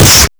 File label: Beatbox Snare, Human Beatbox